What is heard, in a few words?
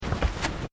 FOOTSTEPS TABI TATAMI MATS STEP; Footstep Foley; Human